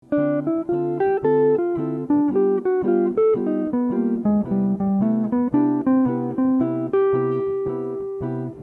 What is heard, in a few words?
major 2 5 1